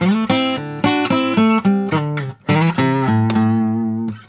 Blues Guitar; ILIO; Fretworks

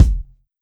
Kick, Drum Hits

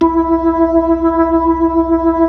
B-3, Club

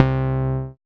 Synth Bass, HIGH LOW; SYNTH-Basses; Sound & Vision; Gigapack I CD 2

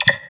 vox, electro vox

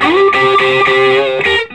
SOLO, Vintage Blues Guitar

